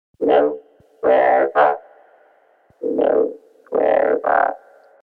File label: Shure headset low square bot; micro freak